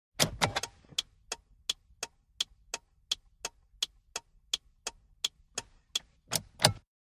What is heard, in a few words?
Montero hazard Light, 1998 mitsubishi montero int.